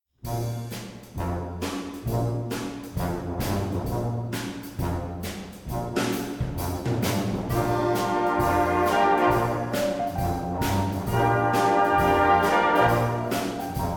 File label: Amen